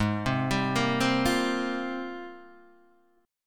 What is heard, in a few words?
G sharp Major 13th, Guitar Open D; 6,3,5,4,3,3